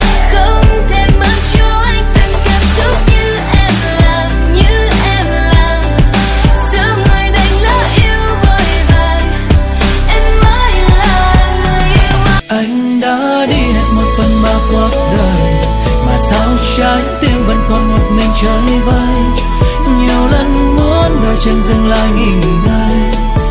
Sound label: vietnamese ringtone